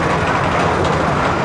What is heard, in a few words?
Coaster